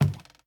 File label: Wood soft